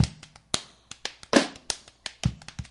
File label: clappers; Drum loops